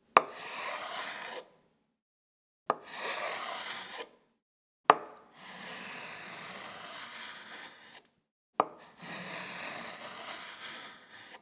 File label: Knife Cuts On A Cutting Board